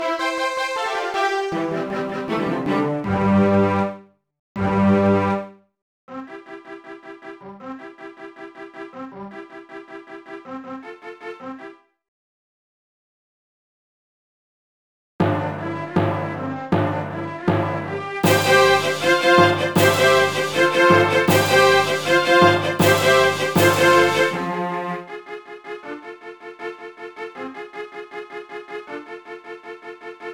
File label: Classical Stage (Karaoke); pinky street kira kira music night pop town gamerip